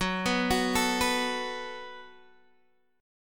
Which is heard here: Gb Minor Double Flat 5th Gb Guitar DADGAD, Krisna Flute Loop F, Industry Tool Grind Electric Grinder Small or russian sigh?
Gb Minor Double Flat 5th Gb Guitar DADGAD